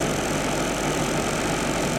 drone move

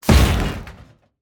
overclock orbiter die

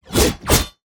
icebreaker attack

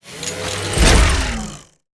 drone attack

